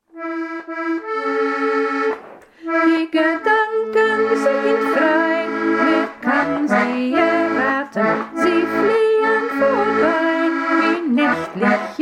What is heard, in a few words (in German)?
Die Gedanken sind frei gesungen